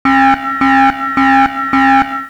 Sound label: alarm